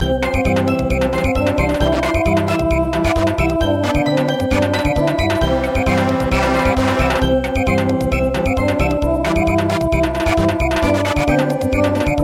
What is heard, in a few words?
Samba